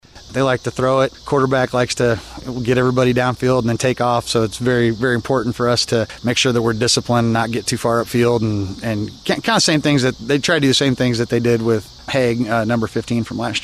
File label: HORNETS